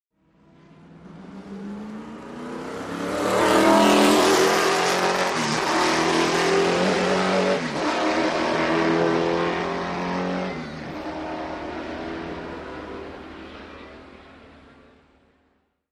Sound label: Sports Car Away CU